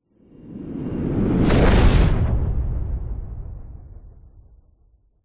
science fiction explosion passing spacecraft blow up; zapsplat